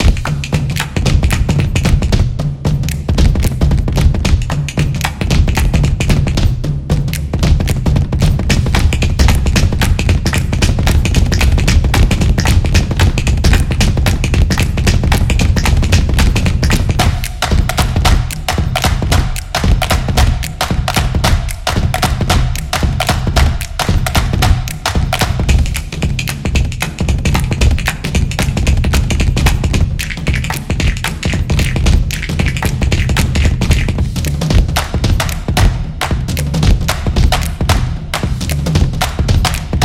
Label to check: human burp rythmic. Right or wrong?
Wrong